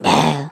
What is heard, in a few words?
death; Gnomes; OldThink